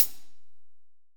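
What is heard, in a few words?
AMBIENCE CLOSED HH; AMB KIT#1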